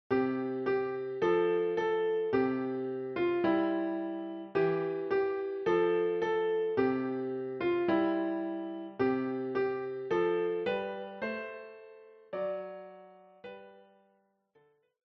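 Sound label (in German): We Shall Overcome, Klavier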